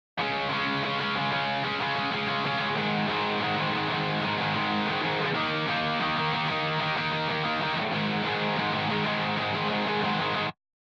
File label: guitar off